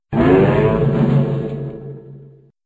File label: bass